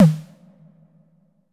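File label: stereo perc, hi-tech kit; Crypton - DrumBase5000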